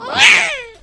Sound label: child angry roar